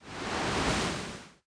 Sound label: Water; New The Denpa Men